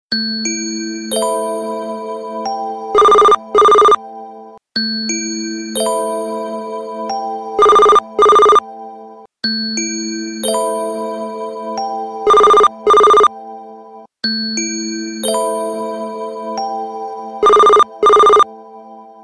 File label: nice ring